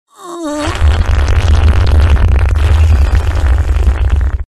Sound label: Extreme meme fart blast; bass boosted